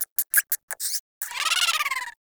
Rodent Vocal Captured Idle; Warframe; Hunting Critters; Characters

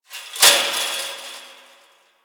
Fence Rattle One Off; Warframe; Ambience; Nineteen Ninety Nine

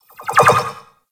Rolling Latcher Embed Glow; Warframe; Grineer

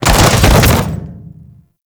Grineer Fall, Body Falls

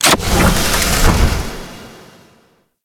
Ship Metal Mech; Warframe; Corpus Ship Remaster

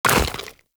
Step Goo, Footsteps Walk; Warframe; Characters; Tenno

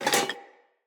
Foley Armour Fill F; Enemy; Mockeries

